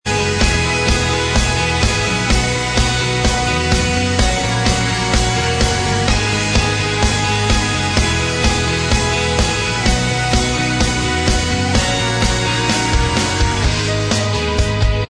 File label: Inst